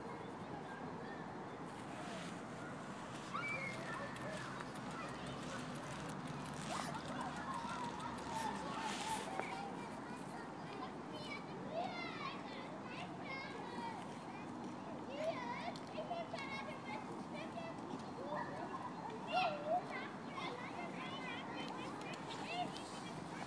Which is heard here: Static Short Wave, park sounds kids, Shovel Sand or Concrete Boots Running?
park sounds kids